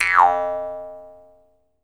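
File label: Jaw Harps, Pitched Percussion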